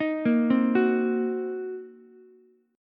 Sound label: Eb Minor 6th, down, Ukulele; Ebm6 arpeggiated; JGuitar